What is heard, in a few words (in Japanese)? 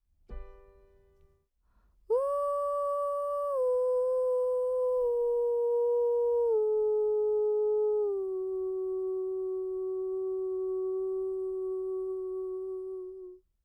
uragoe